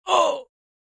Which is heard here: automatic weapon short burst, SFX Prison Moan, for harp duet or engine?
SFX Prison Moan